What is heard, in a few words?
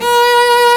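STRINGS SOLO, Chamber Grp; Roland L-CD702; Combos 4; Chamber Grp2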